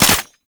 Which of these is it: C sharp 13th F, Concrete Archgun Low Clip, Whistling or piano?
Concrete Archgun Low Clip